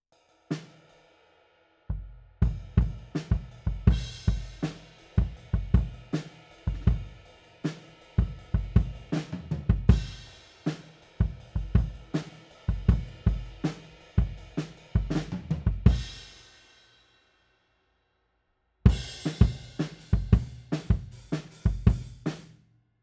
Kick Out, 160 BPM

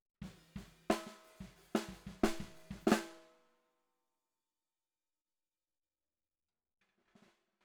SNR Bot

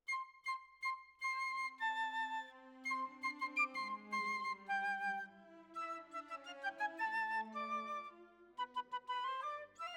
Flute

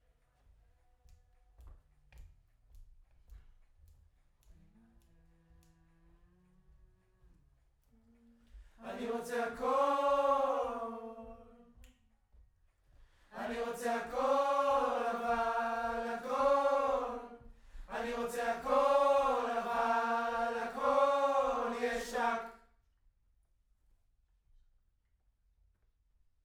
Choir Far

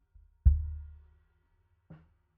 Sub

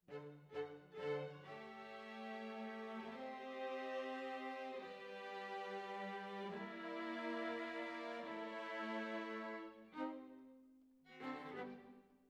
Violas Over

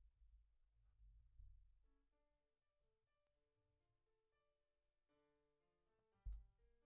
Kick

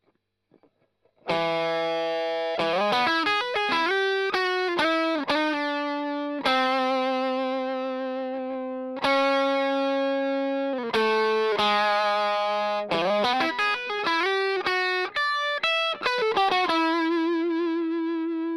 Strum Line

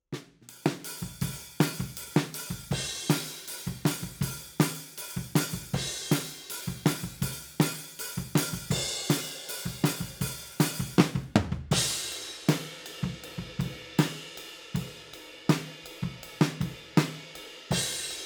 Overheads, 160 BPM

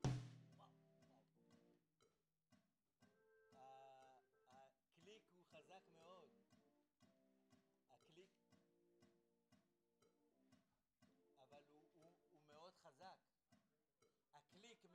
SNR TOP

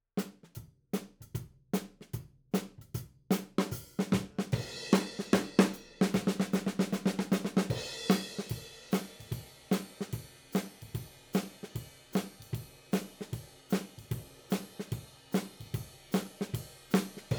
Ragtime

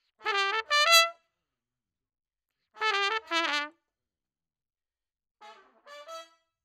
Trumpet

